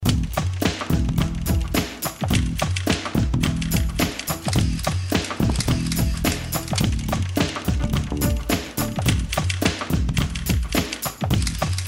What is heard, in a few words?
LOOP